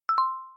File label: particle Drop; build-an-atom